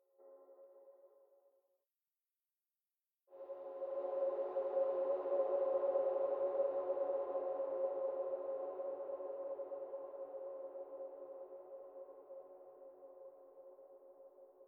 Surround Dronetail

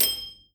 Ding

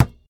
Basketball

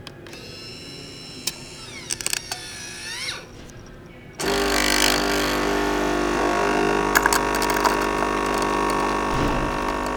Machine Coffee Machine Office

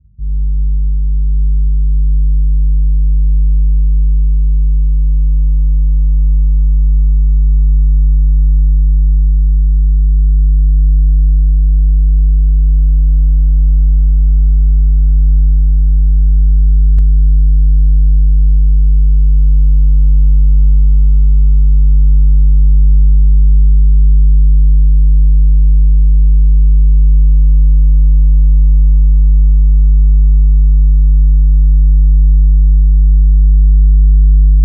Hum

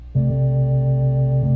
Thomas Organ

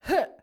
Jump groan

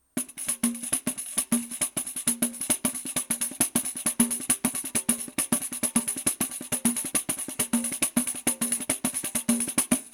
Pandeiro

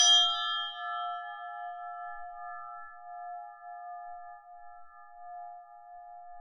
Flat bell

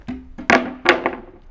Wooden Board On Sidewalk

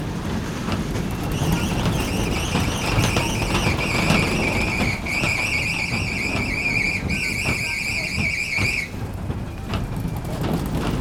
Rollercoaster Riding Looping

